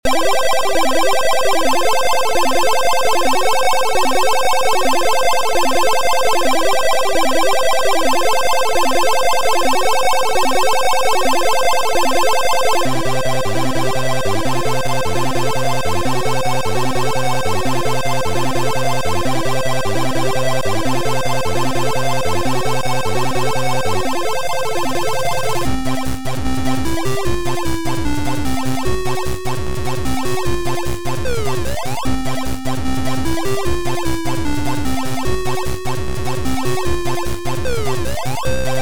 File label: Bell; Qskill1